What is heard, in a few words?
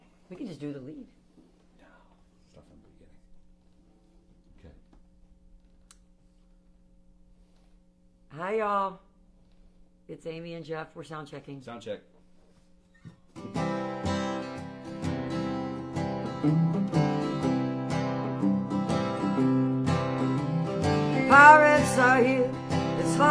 soundcheck